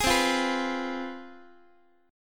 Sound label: C Major 7th Suspended 4th Sharp 5th, down, Guitar; CM7sus4#5 strummed; x,x,11,10,9,10; JGuitar